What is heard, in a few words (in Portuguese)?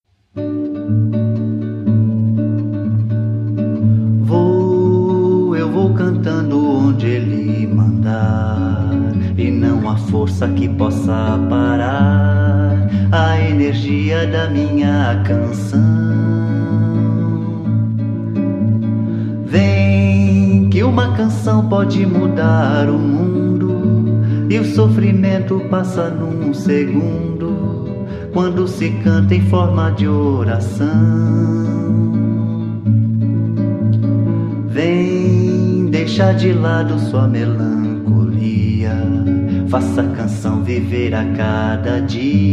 Canto forte